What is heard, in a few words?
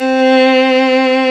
ARCO VIOLIN, Arco Solo Violin